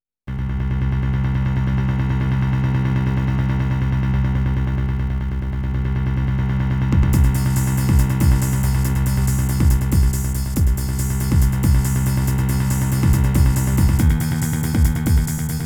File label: Suspense